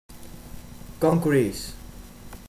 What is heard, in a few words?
concrete, spain